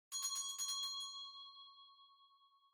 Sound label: Winning Bell Far Gentle